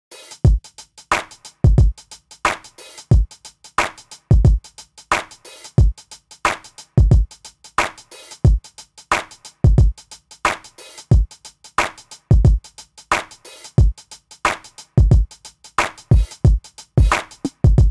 BBox

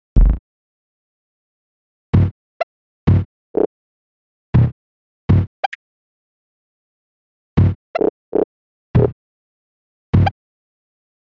Grit Kit

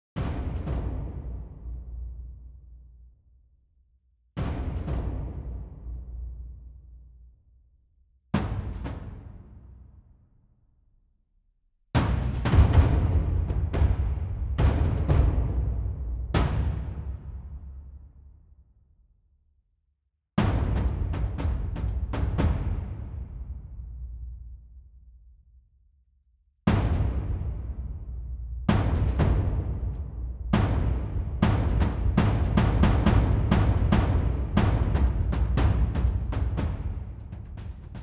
Action Strikes

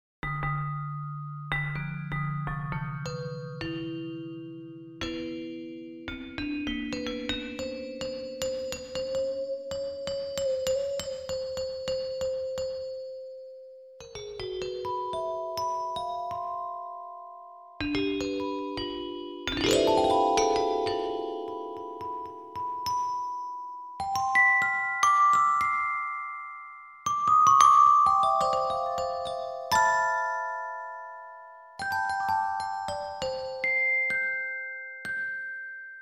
Alto Glockenspiel

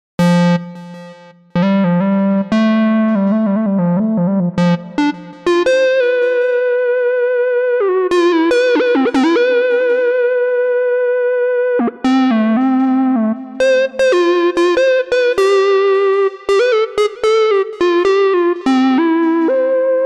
Voltage

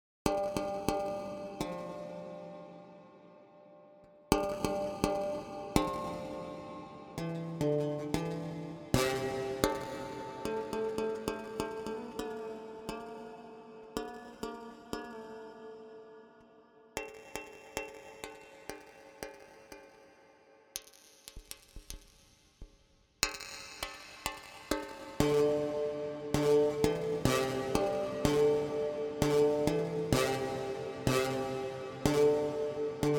String Studio VS3

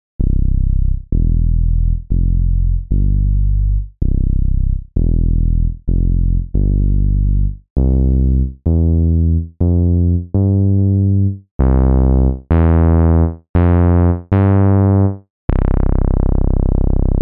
Voltage

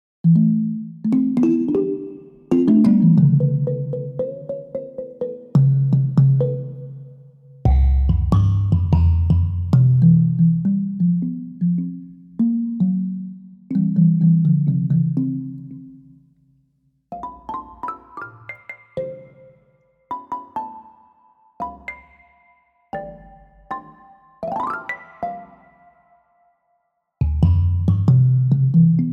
Giant Bass Tongue Drum

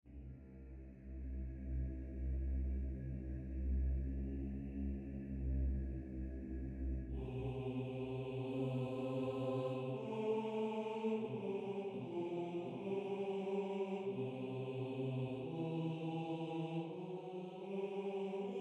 Olympus Choir Micro

